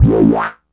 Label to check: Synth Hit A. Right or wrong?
Right